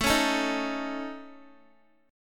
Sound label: A Major Flat 5th, Guitar; AMb5 strummed